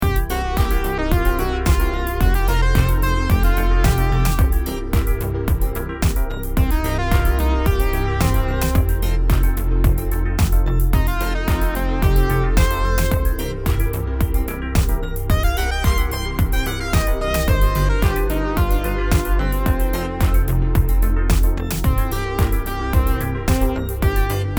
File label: Twelve Bar Blues